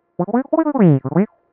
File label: Vocal; Hylics